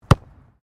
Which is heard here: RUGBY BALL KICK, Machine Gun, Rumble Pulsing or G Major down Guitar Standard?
RUGBY BALL KICK